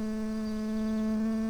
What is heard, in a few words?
bumblebees, Hypnorum workers